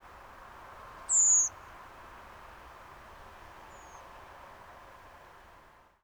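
Redwing Turdus iliacus, Two Redwings calling at night over a town; nocturnal flight calls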